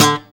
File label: PULL, Acoustic; Sound & Vision; Gigapack I CD 2; GUI ACOUST.; GUI Acoustic 1